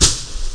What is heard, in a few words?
SOUND HAY; PC Gamer 4.2; mtm2demo